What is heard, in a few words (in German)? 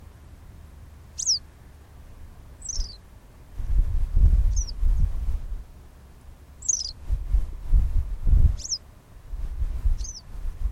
nordische schafstelze